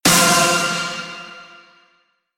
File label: word success pinch; typing; tf2ware ultimate